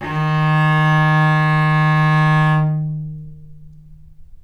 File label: cello